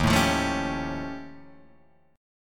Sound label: Eb 9th Flat 5th, Guitar, Drop D